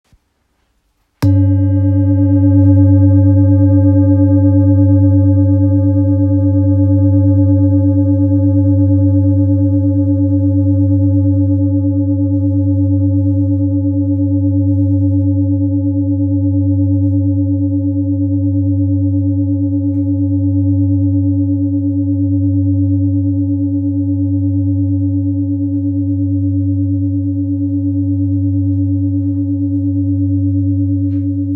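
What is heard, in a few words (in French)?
Bol full moon fa, bols chantants tibétains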